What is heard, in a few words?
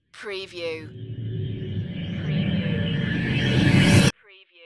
ghost glitch riser